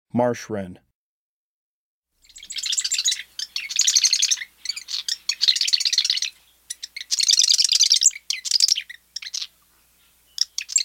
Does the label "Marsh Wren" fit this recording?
Yes